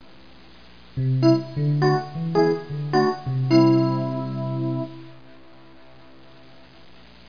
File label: synth; Broderbund Print Shop Multimedia Organizer Deluxe Edition